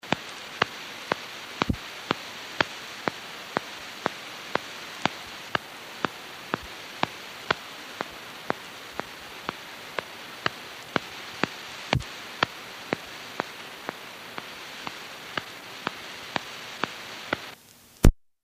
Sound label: pulse